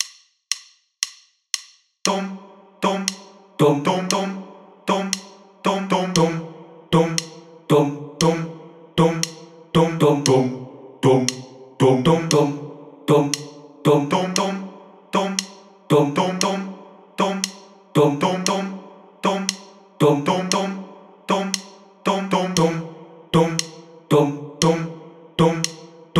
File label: bassline